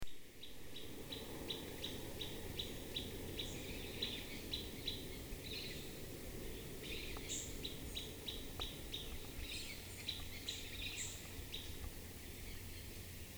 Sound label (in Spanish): Burlisto Chico, Contopus cinereus, Tropical Pewee; cantos